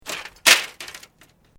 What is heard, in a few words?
Metal Light Movement Minor; Sound Effects:Materials